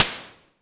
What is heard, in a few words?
Noise Hit, Clavia DDrum AT, Pitched Down